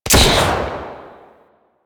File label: Sidearm Shot, Weapons; Warframe; Tenno; Wraith Sidearm